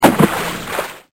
splash

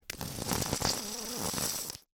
slurp

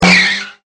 PEEL OUT

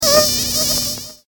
mosquito

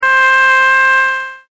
oboe

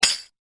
Tamborine

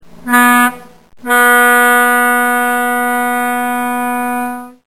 tug

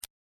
tool layer Thumb Press

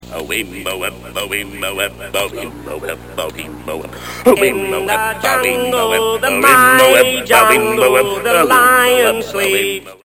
lion

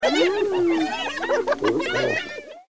NOISY HYENAS

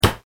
Tank Fire

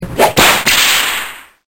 whip

